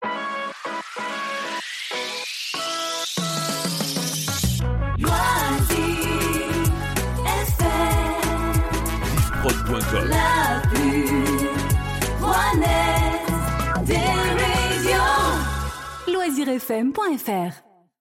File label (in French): Jingle Chante Gold; Loisirs FM